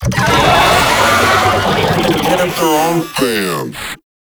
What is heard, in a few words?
Idle, Vocals; Warframe; Proto Infested; Babau